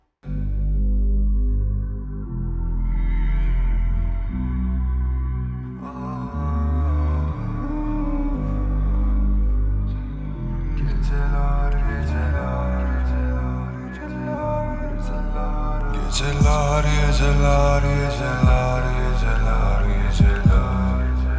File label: Slowed Reverb